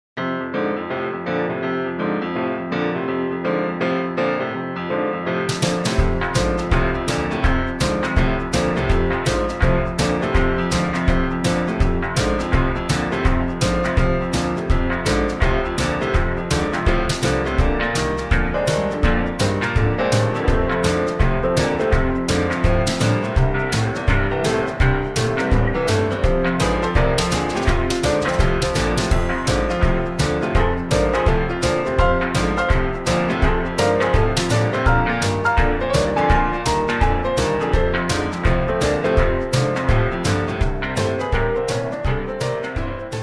F No Backing Vocals, backing tracks

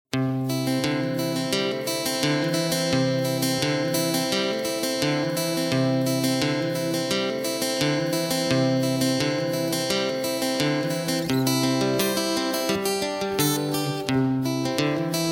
(C) No Backing Vocals

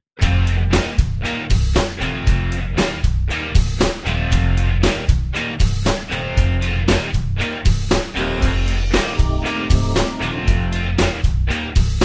No Backing Vocals

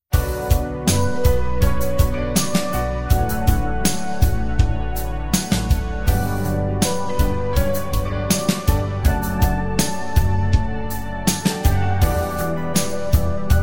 (D) No Backing Vocals

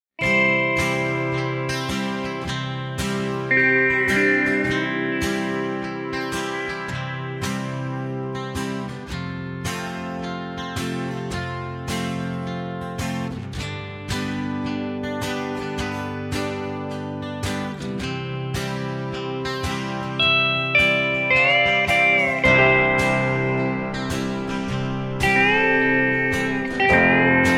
(C) No Backing Vocals

